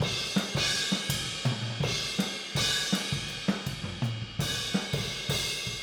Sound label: Boogaloo Fill Overhead ST, 82 BPM, Drums